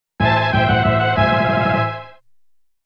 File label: Normal Game - LOSE; Super Famicom Version; Godzilla Kaijuu Daikessen